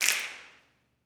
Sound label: SNAPS, Gospel